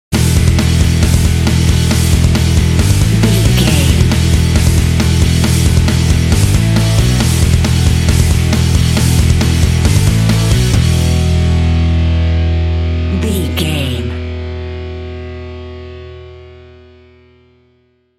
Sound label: heavy smashing beasts sting